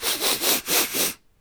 Mouth Fx, Vocal

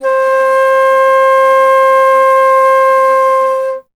Alto Flute; Miroslav Vitous - Solo Instruments VOL-2

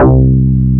BAS, Eurotech; Sounds Good

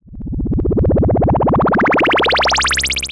Bubbly, Synth FX; musicradar; cartoon caper

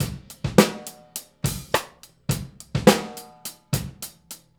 Straight Kit, World Pop Beats; Straight Kits; Sonic Foundry (Sony Creative Software)

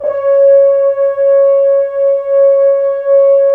BRS, FHns Dry; Roland LCDP06 Brass Sections; F.Horns 2 mf

